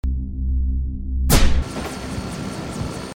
Gravity Gun; Infiltrating The Airship; Vault

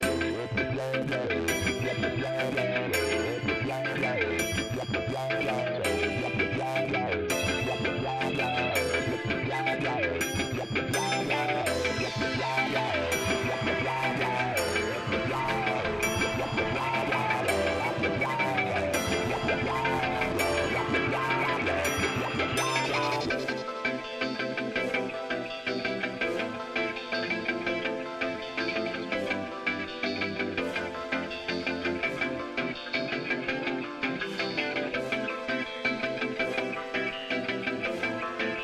Melody; PixelJunk Shooter; PS3

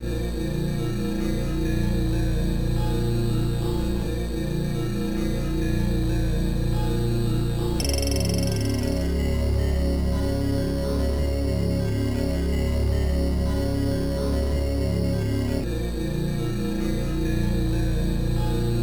Tower Ambient; Perihelion The Prophecy; Amiga

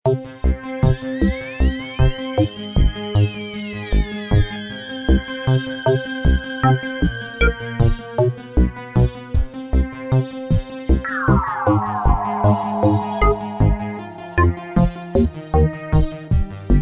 Spacey Bass pulse; Rabbids Big Bang; Android iOS Windows gamerip 2013

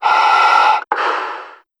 breath fastish; Shattered Horizon

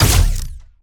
Gunauto Plasmid Machinegun Single

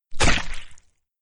splat sound; Arc of Templar

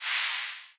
Steam Start; Cut the Rope Holiday Gift; android ios mobile gamerip

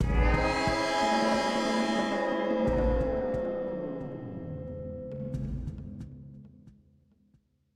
Music Stinger; Entrance Mood 1

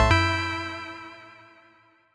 Key Pickup E

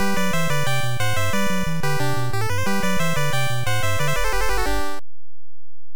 Game Start; Kekkyoku Nankyoku Daibouken; NES

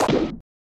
bang

